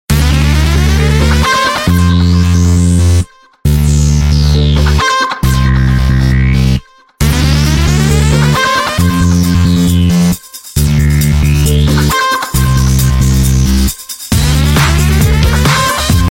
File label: nokia x6 ringtones